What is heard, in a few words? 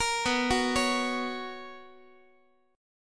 B Major 7th Suspended 2nd Guitar, chord; BM7sus2; arpeggiated; JGuitar